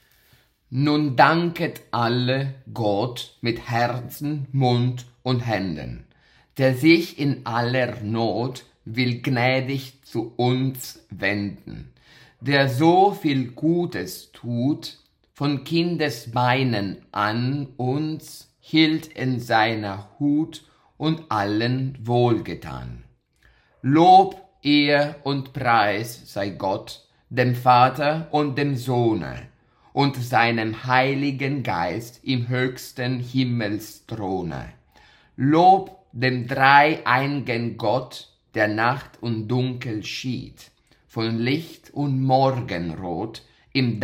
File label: Chorale